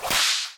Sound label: whip attack